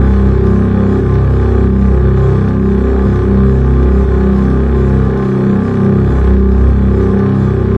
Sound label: super c f ff subloops, double bass arco; dsmolken